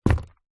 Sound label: foley footstep single shoe business leather buckle zipper womans on wood floor